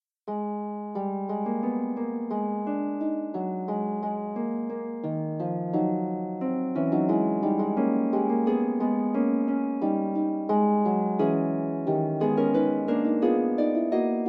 Fugue, Harp

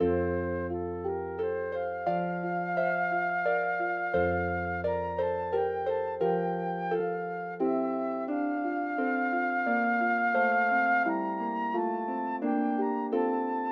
Flute and Harp; Wedding Music for Flute and Harp Vol 2; dance of the blessed spirits

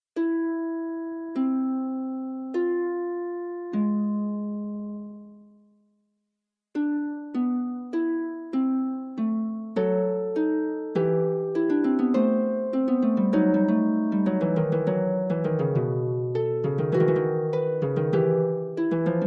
Fugue, harp; Forty Eight Preludes and Fugues Book 2

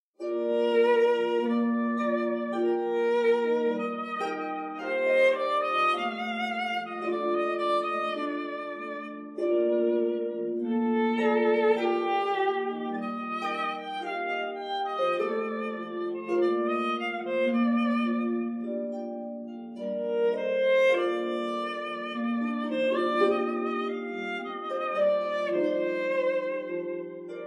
Violin and Harp, Tango; Six Pieces for Violin and Harp

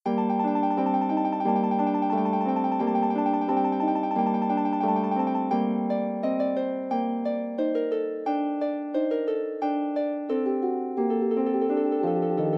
prelude, Harp

